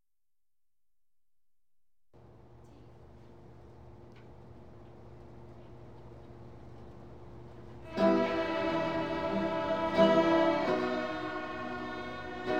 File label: baroque toccata